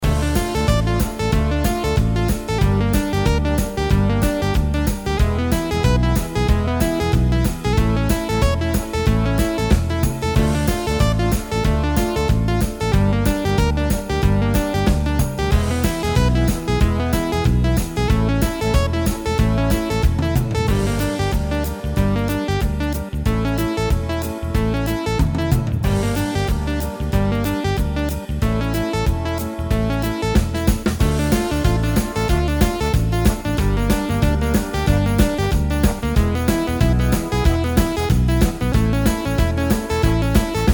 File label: Coin Op Chaos